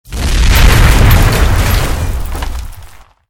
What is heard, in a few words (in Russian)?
vzriv